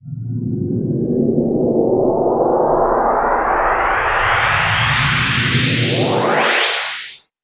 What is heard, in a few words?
Uplifter